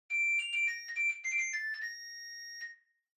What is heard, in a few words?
Washer Front Load Beep Far Melody